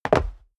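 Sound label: step dress boots concrete jump, footsteps; schritte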